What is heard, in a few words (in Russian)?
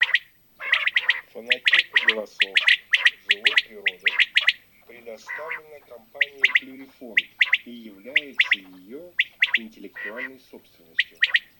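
Перепел Quaglia, chip mini для манка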